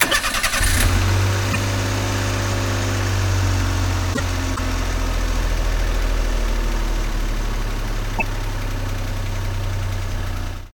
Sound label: CAR; pictcode